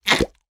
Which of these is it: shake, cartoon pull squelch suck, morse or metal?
cartoon pull squelch suck